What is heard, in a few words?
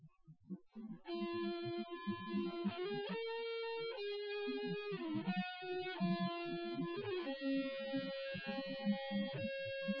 nodrums